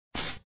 shovel

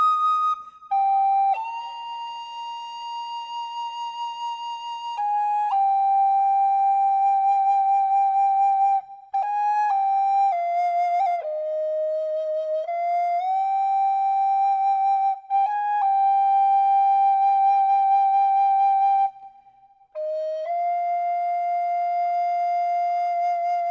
duet pizzarecorder 100bpm EbMajor; loop; chicory; chicory a colorful tale; ps4

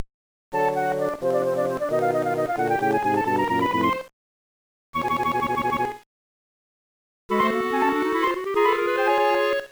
Transition; Tiny Toon Adventures Scary Dreams; gameboy advance

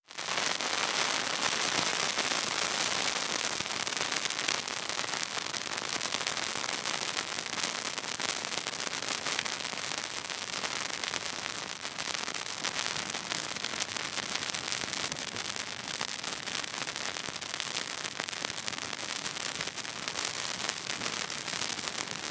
Clothes Burn

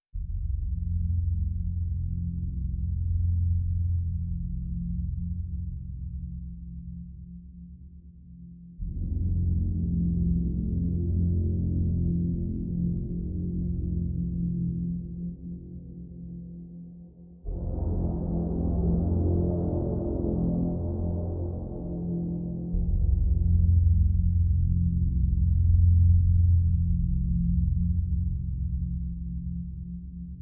Lower Level Ambience

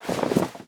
Tnt Box Parachute Open

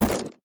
Crumble Block

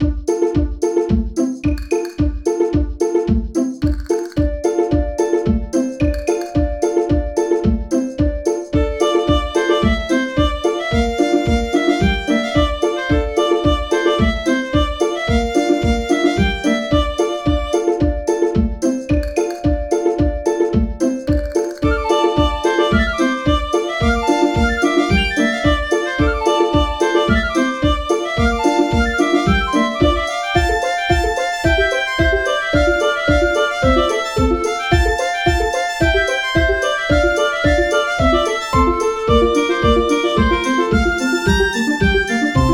Jig on Pizzicato; Furdiburb; Android; A Jig on Pizzicato Beach

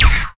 Menu Cancel

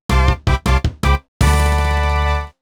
Jingle Win

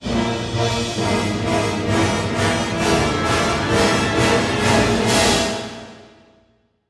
Sting

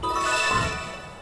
Mission Jingle

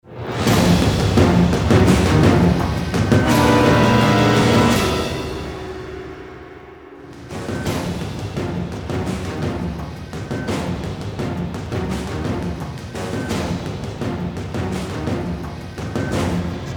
Level music

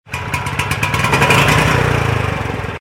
Generator Start; Granny 3; Android

